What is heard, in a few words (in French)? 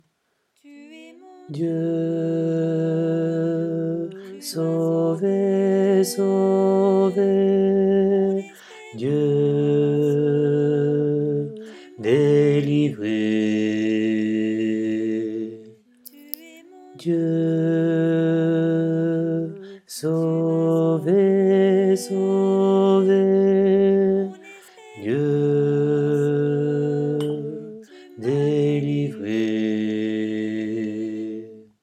tu es mon dieu basse